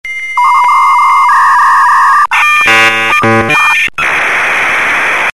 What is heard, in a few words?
Modem Dial-up